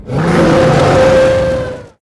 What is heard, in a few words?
Scream; Dino Donkey Dash